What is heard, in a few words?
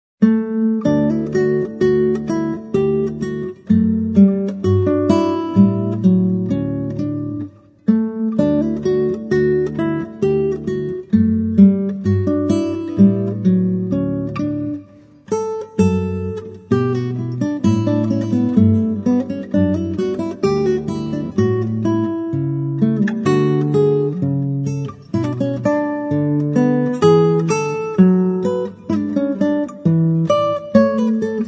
solo guitar; bach sleepers awake (from cantata 140)